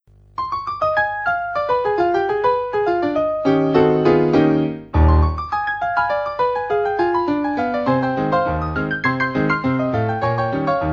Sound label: piano